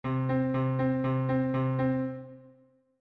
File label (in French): basse disco DO Majeur C